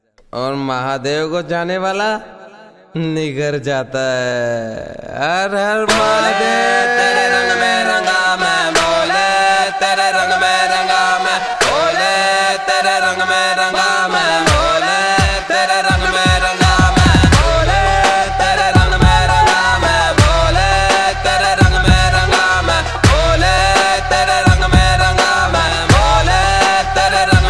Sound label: Haryanvi Trance